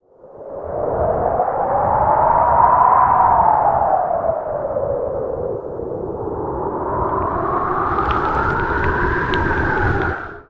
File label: WIND; Best Service - XXL Production Effects; WIND+STRM